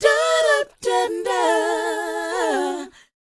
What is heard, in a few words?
DOWOP B, Vocal R&B DOO WOP; ILIO; Vocal Planet